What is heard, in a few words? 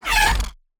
skewer scifi